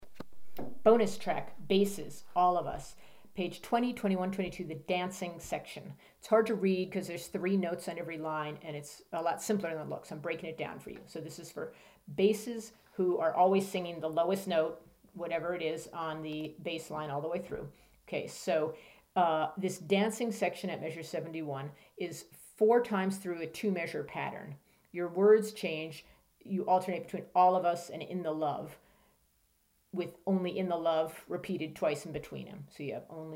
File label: bass